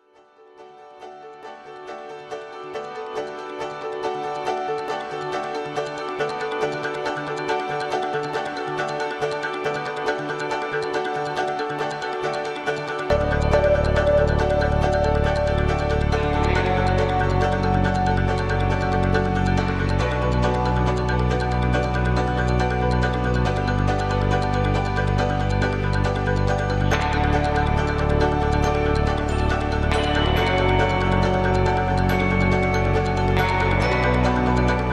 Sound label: Instrumental